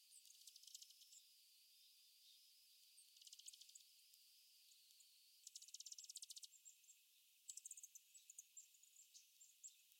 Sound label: DEJU Chatter